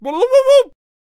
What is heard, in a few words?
Potionseller Attack Vocals